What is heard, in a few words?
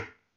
Cup impulse